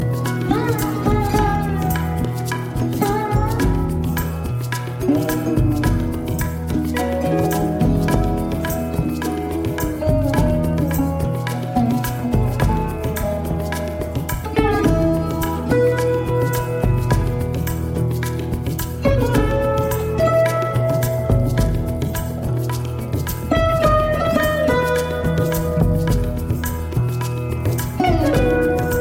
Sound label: Classical; All Bliss